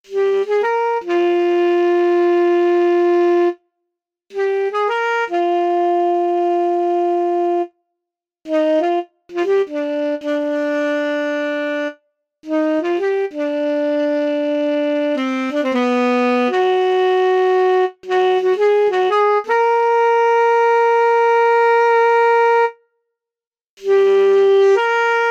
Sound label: Tenor